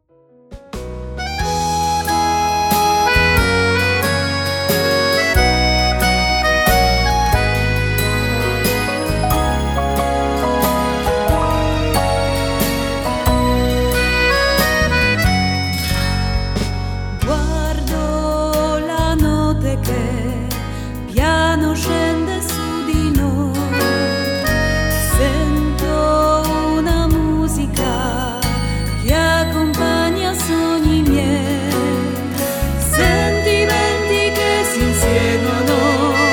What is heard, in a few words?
waltz